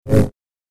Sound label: Double Bladed Saber Sound; FXHome